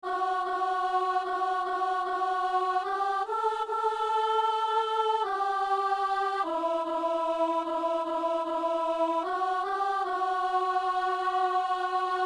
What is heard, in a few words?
soprano